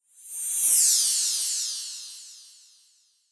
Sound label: Whoosh Laser Shimmer; Blastwave; Whoosh Sound Effects